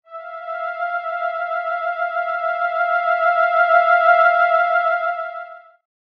Sound of Fear

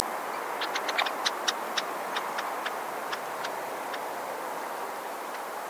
Phalaropus lobatus - Red-necked Phalarope

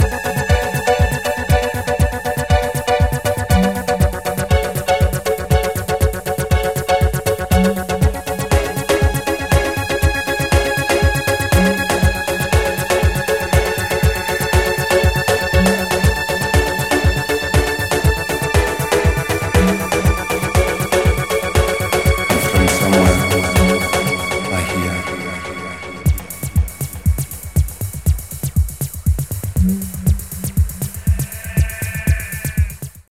Pure Trance version

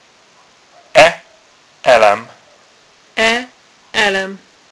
E hang

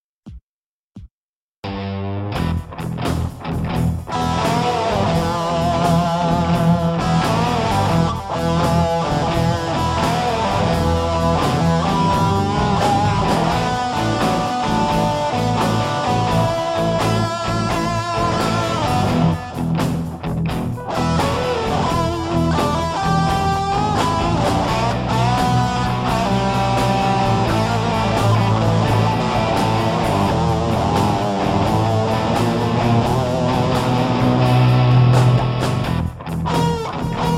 Jam to R8 Slow Shuffle

